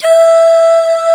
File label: OOOHS, VOX; SC55; East West - Global Sounds